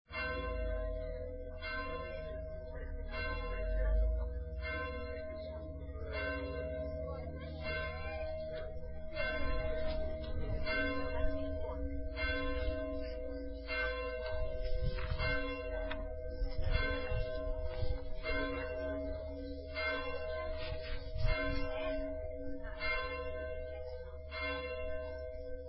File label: bells at Fingest from churchyard